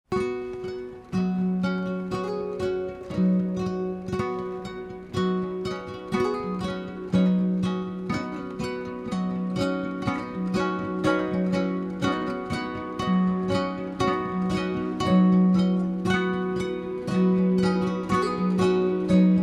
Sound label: Guitar Theme